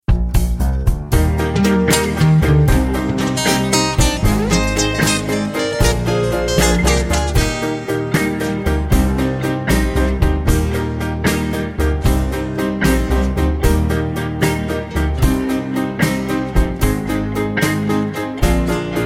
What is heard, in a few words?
(Eb) No Backing Vocals